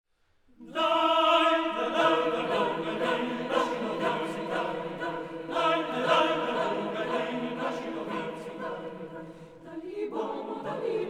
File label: Choir; Kodaly Centre 2015; Slovenian Philharmonic Choir